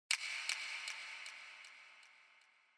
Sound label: Finger Snap Foley Delay